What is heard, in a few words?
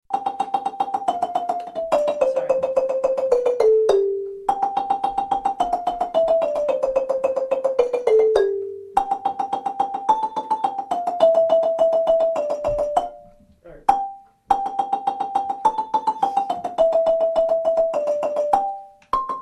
lead rolling, marimba; babamudiki